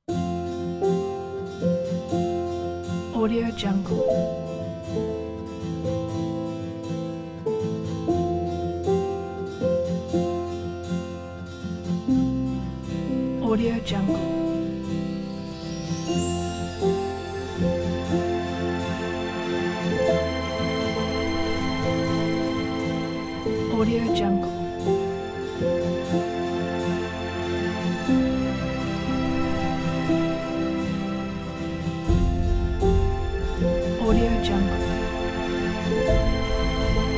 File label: emotional cinematic piano and guitar